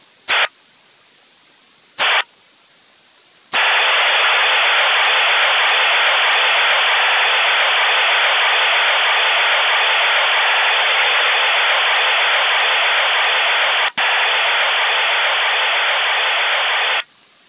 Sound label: RUS 3000Bd 8PSK serial tone long; Russian 3000 Bd serial tone waveform sending short and long bursts; PSK-systems